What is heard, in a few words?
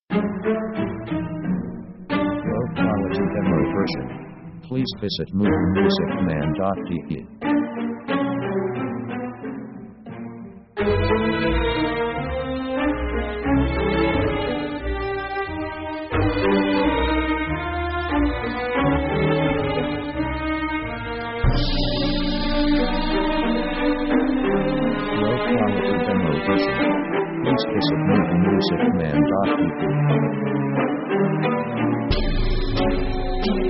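orchestral strange marching; Action themes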